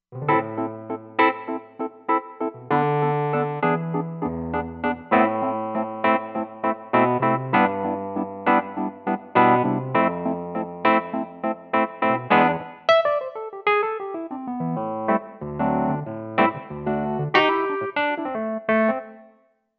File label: Wurly